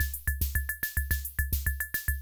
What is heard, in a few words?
Kay R-12 Rhythmer Loop, Drum Machines